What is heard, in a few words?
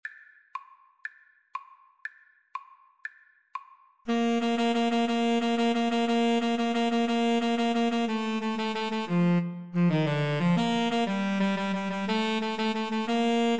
Tenor Sax Duet